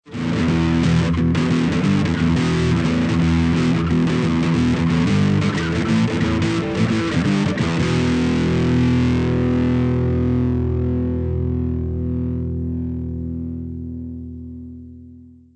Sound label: V8 line out crunch; V8 rig